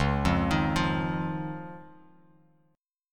C sharp Major Add 9th, Guitar, Open F; C#add9 arpeggiated; JGuitar

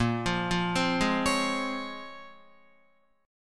Bb Minor 9th Bb, Guitar; Bbm9; arpeggiated; JGuitar

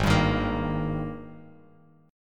Ab Major 7th Suspended 2nd Ab down Bass Standard; strummed

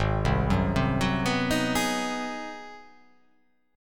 G sharp 7th Sharp 9th

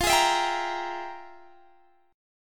F Minor Major 11th Guitar Drop D, chord

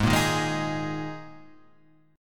Gsharp Suspended 2nd; x,x,6,3,3,3,5,5

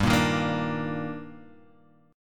D sharp Major 7th G

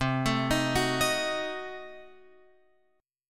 C Diminished 7th C, Guitar Open F; arpeggiated; JGuitar

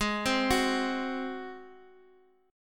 Ab 7th Ab down Guitar Drop D

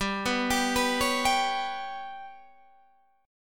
G Major Flat 5th, chord

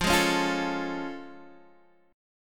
A Augmented Major 7th F

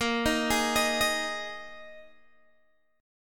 A sharp 7th Suspended 4th A sharp, Guitar Open G

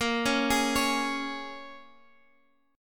Bb Minor 7th Bb; Bbm7 arpeggiated

